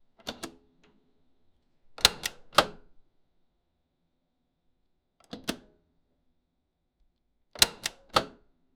mechanic airlock opening

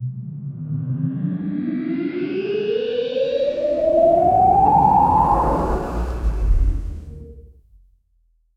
emerging its super power, power

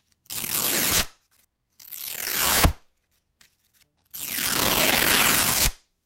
abruptly tear the paper

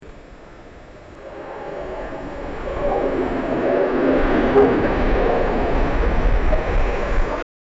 the outdoor sounds as a, launch

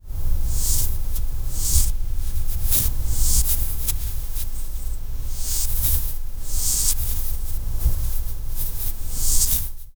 chalk scrapes against; flutter

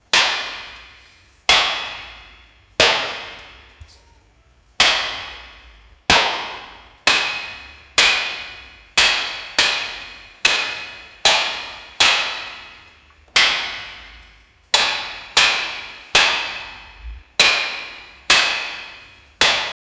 jump rope in a boxing, gym

